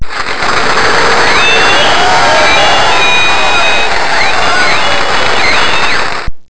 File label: applause